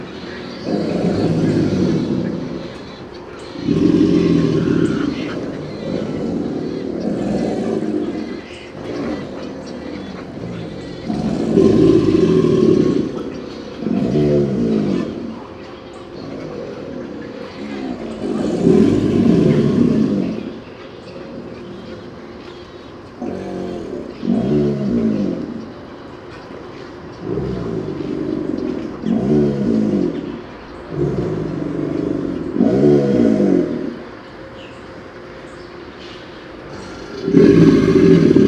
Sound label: Alligators bellowing